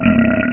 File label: burp; Zoom - Release 2; Active Software